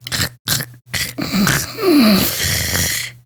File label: Snores, Daughter, Vocalizations; Warframe; Deimos